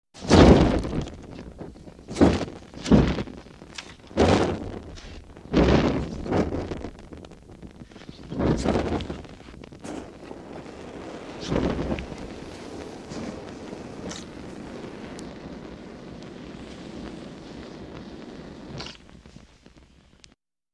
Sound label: Chemical Flame Flares Up As Wind Blows On Fuel